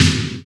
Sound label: SPD-8, DIGITAL; 90 s Sample CDs; Sound & Vision - Gigapack I CD 1 (Roland)